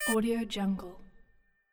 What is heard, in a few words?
futuristic digital interface beep and click